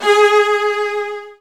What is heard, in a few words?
Virtuoso Strings; ILIO; Kirk Hunter Virtuoso Strings VOL-2